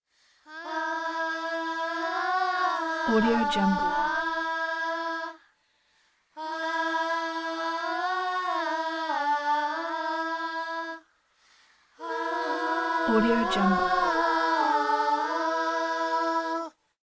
children chorus aahs girls and boys; prosoundfx

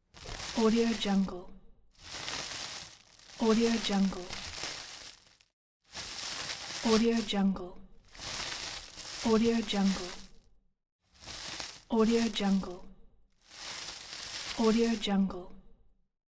rustling

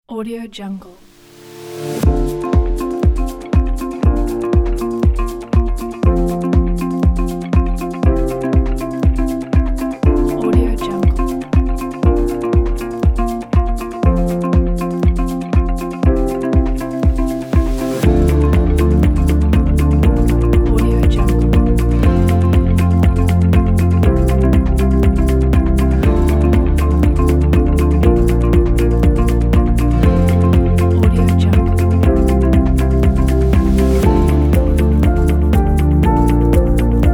uplifting corporate

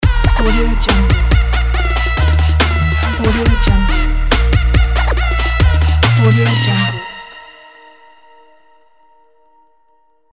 the trap logo

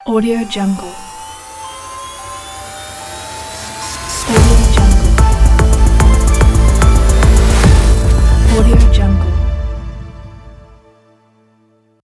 news intro logo; gujymusicproduction

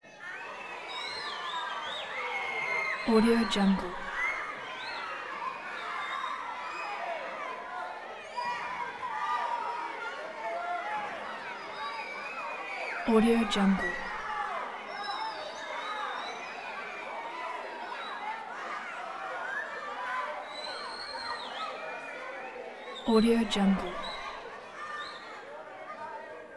auditorium crowd younger teens walla chatter; prosoundfx

